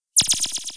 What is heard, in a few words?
Flash laser